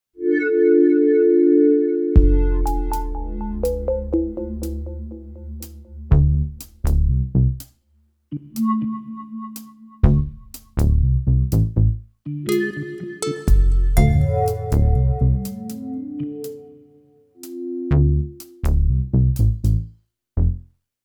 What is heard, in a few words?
music loading